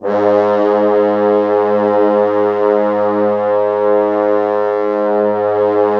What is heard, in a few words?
G#1, Orchestral Brass